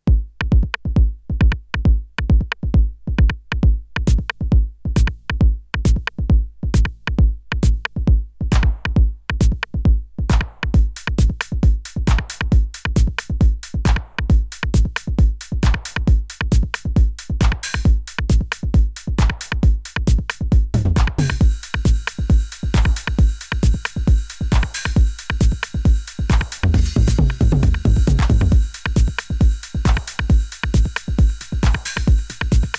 Roland TR 8S Pattern

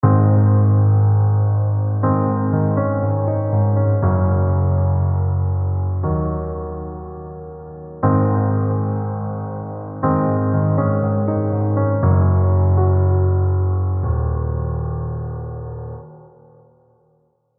EZkeys 2 Session Grand Filtered House

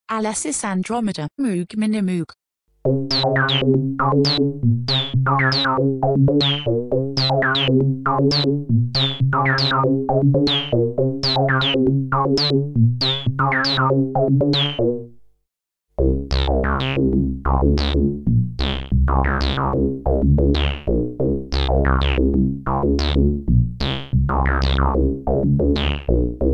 A6 Minimoog Sequ With Reso

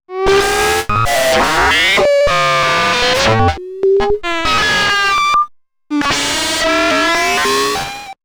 SOMA ROAT Full Chaos Sequence

